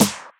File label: Drums Techno Clap Snare